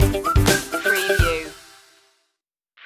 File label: tell me more stinger